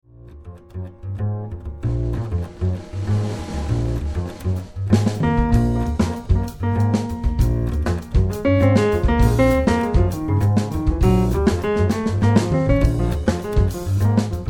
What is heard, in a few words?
marche funebre, b moll